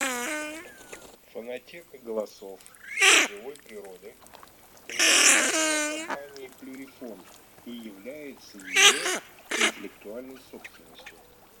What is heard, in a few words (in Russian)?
Красноголовый нырок Moriglione; plurifon